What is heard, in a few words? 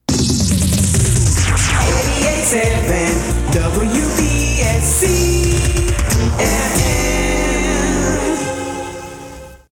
JINGLE Fast